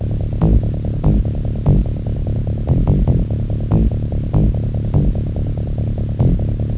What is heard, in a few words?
Bass Loop; HotLeather2